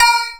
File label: A#, Synclav FM PERCUSSION; Big Fish Audio